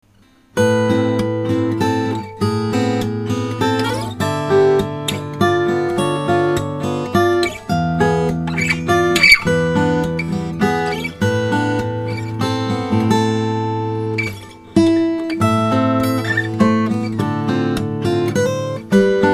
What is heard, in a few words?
mic